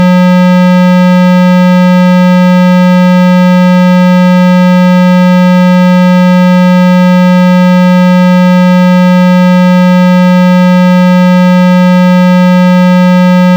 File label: techno trance MIX; MED; Robin